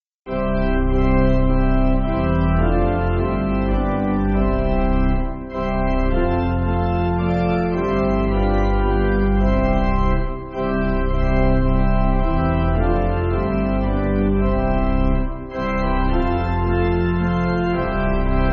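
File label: Pipe Organ, church backing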